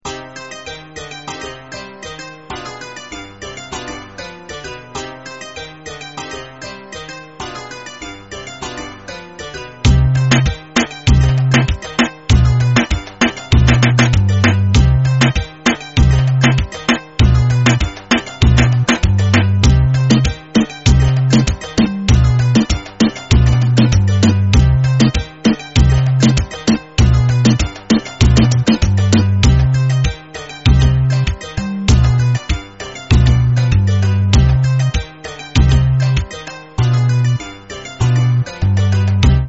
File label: Reggaeton